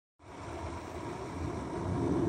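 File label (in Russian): звук вращающихся колес лонгборда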